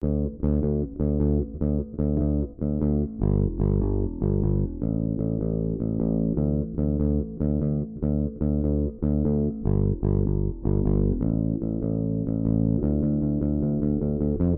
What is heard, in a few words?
bass tube saturator